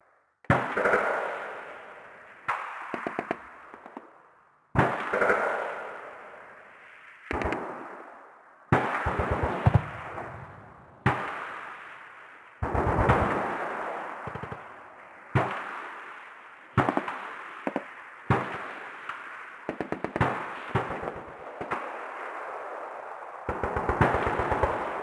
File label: combat atmosphere; World of Tanks Blitz; ambient neptune